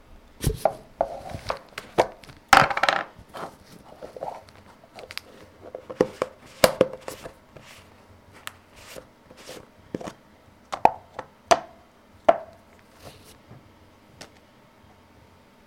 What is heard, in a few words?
Fumbling